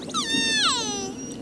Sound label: orca